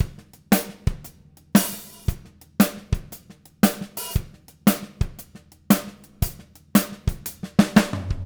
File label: Living Drums; Sampleheads; Peter Erskine